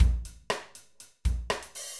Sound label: thuddy